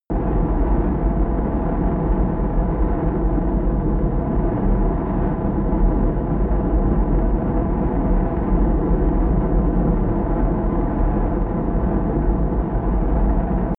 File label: missile medium fly loop distant